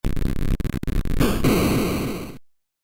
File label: shoootandkill